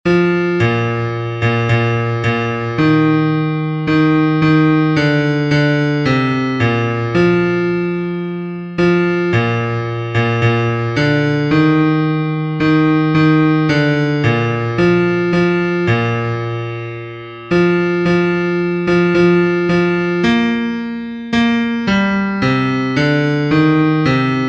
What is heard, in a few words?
Bass